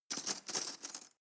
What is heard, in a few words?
cutlery teaspoon drawer handle